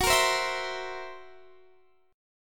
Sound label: Fsharp 7th Flat 5th down Guitar Standard; strummed; JGuitar